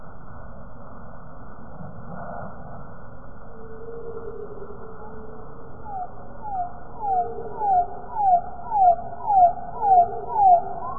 birdsong slowed to 15 percent, Portnoo Donegal Ireland; SPMcGreevy